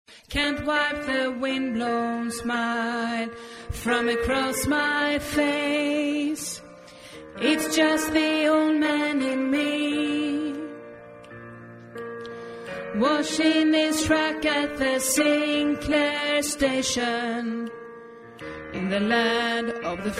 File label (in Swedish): bas, stammor